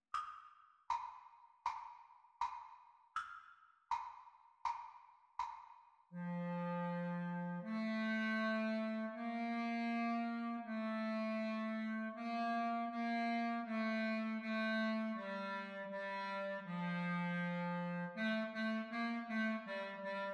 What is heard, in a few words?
Exam Recording Clarinet Duets; Grade 1